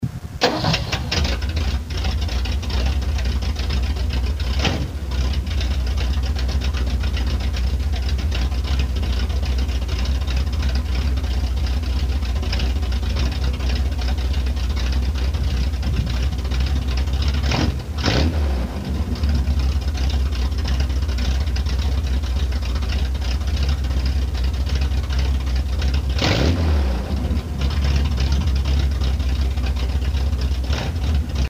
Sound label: cammed